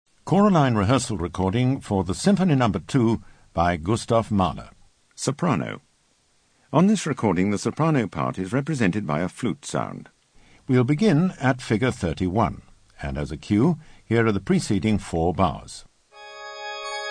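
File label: Soprano Alto; Mahler Symph No2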